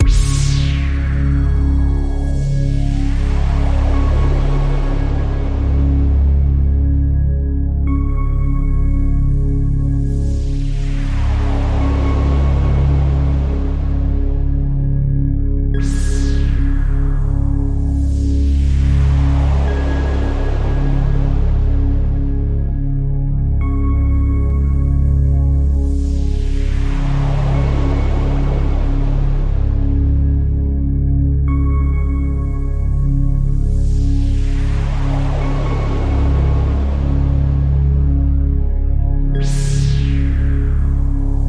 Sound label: Radio Toolkit Pad